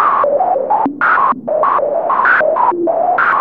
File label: RANDOM NOISE; Zero-G Ambient 1